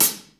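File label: hi-hat rock closed; 300 Drum Machines; Yamaha Motif ES-Series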